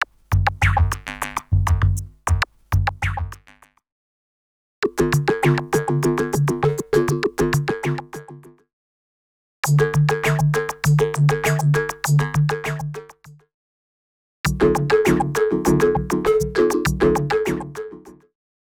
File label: Man Machine 100bpm; X-Static Goldmine 5